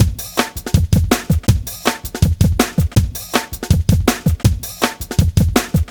Jam, Loops, breaks; m8 breaks

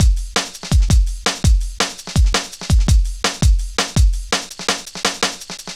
Drum Loops, Jungle, 166.5

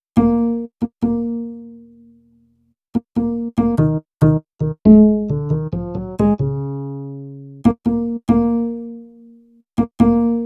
Upright Goodness; Sonic Foundry; Cinematic Funk Urban Tone Poems; Master Zorn

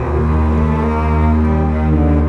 Bass Cello, Cinematic

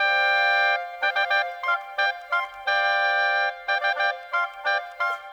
Oboes; Sonic Foundry (Sony Creative Software); Orchestral VOL-2; Modern

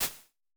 Shakers, Vermona Mono15 Drums

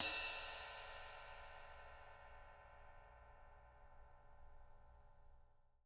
rides

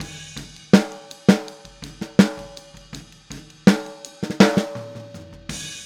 Boogaloo Fill Snare, 82 BPM, Drums

